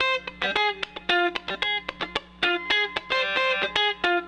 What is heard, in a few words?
Raw Funk Guitar